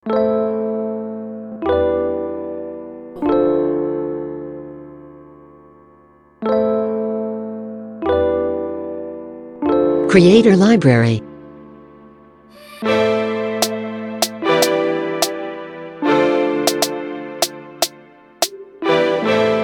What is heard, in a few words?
Future Trap, Hip-Hop music